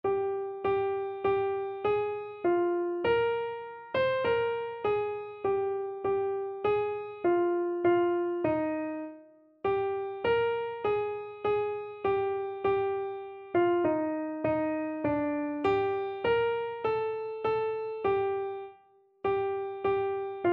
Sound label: found a friend, keyboard